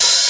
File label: damped open high hat sound b key, Royality free open hat one shot tuned to the B note.